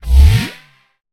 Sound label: scatter addon; spinsane